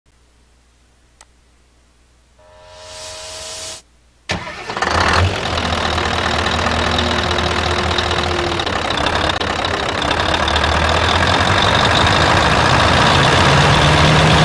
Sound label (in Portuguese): Som de Caminhao Motor Scania V8